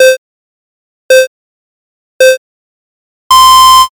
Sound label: countdown